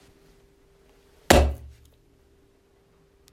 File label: Plopp